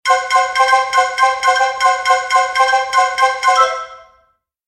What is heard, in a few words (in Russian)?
standartnoe sms na nokia n76